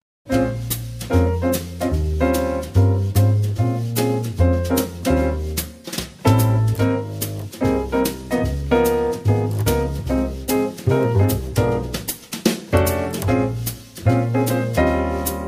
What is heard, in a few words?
jazz trio